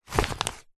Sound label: paper glossy thick pick up; foley; zapsplat